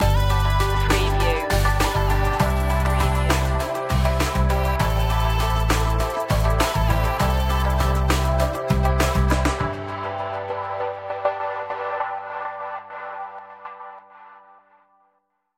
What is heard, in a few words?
funky dream stinger